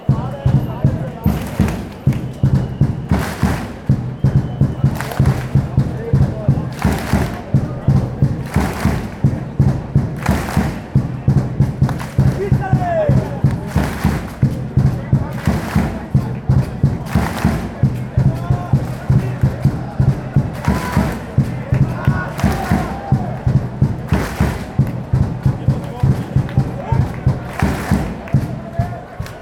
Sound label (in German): trommeln und klatschen fanchants